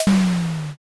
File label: door open